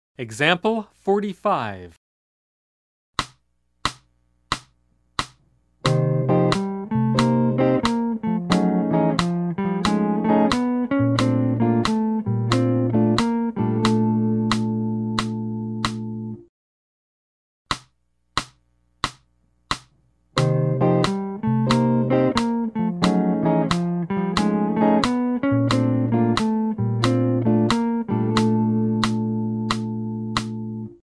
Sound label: Jazz